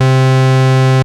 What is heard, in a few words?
Analogue hits and octaves; FM 57 - The Ultimate Sample Collection (1997)